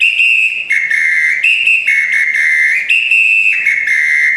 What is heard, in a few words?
Apito